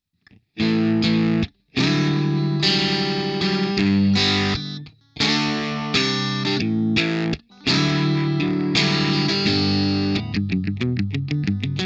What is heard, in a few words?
mesa dual crunch